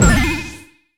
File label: Lucky Bell Statue sound effect; SM3DW